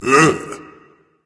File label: ugh berserker; cuchulainn